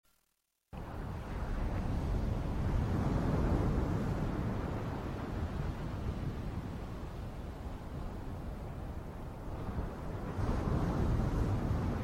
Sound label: Ocean wave & louder Sound track